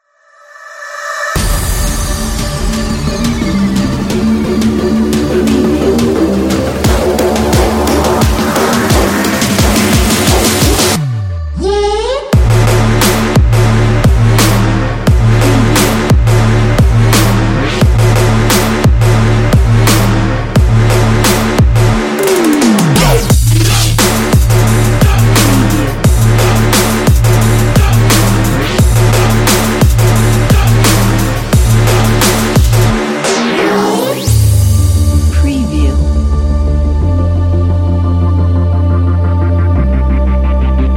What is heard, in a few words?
trap boom